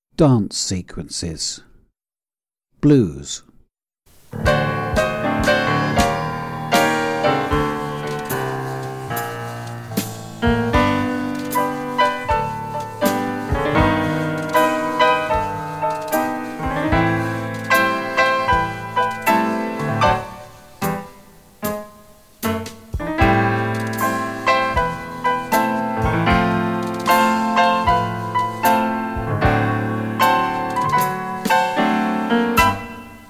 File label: Blues, tap